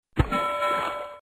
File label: mine